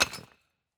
mine stone

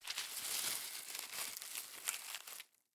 leaves, foraging

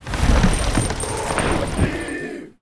alarm warship; ships siege

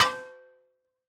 mine metal

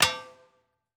mine metal

